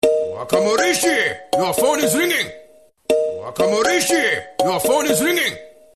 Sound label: phone ringtone; bollywood